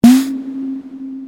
SD, vermona drm1

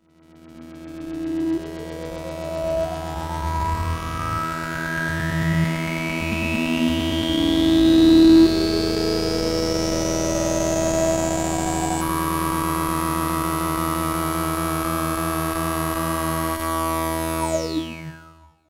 syrinx; synton

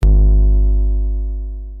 BD, vermona drm1

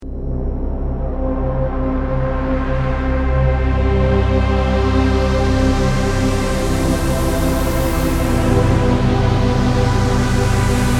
strings, chameleon; dunkelbunte

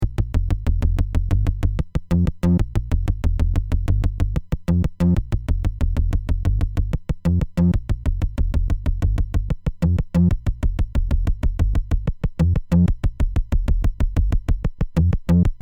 filtertyp morph, waldorf q plus

